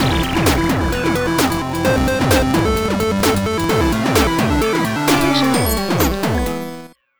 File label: 8-bit v2 sub